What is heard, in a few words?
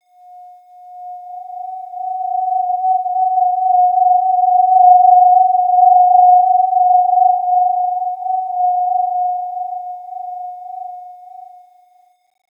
Shakuhachi (trill), Shak * 4